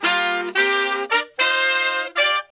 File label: BRAZ LICK, BRASS LICKS